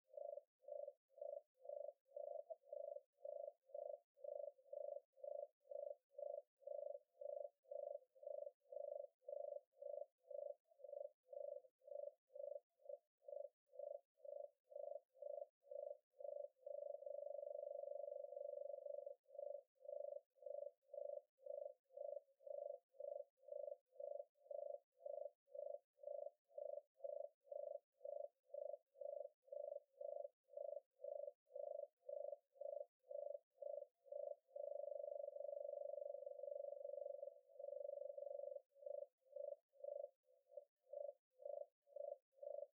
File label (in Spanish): rana; basel; choir of species